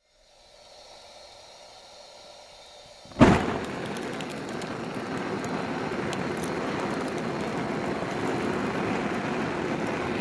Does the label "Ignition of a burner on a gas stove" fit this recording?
Yes